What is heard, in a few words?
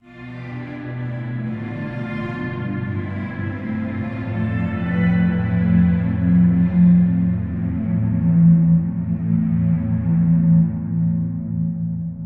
Dance Strings Violin Studio Fantasy Mystery Dark Reverb